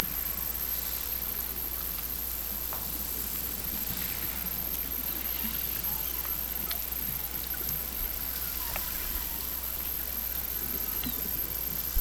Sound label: Hydrophones, orcasound